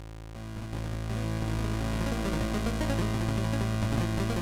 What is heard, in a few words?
8Bit Opening Movie titlescreen loop Start